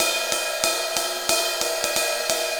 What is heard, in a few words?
Ride Cymbal Pattern